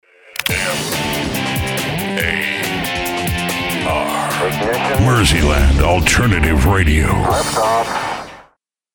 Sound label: LIFT OFF; MAR Jingles